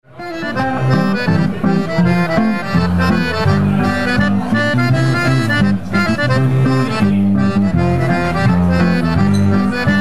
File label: German Band Karlstad schottische duo